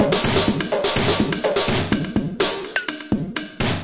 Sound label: 125 bpm dirty clanging bonkers, drum loops and breaks